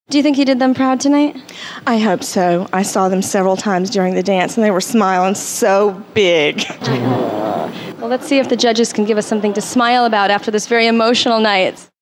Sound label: dancing Fart